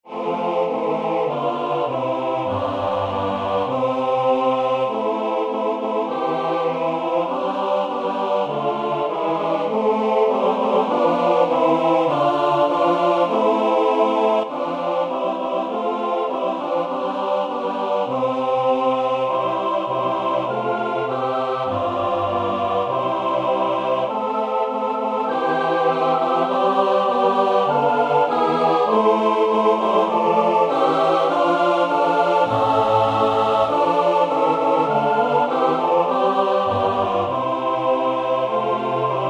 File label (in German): Andante Tutti; Jauchzet